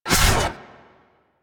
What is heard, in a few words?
Glaive Whoosh; Warframe; Glaive Ghost Catcher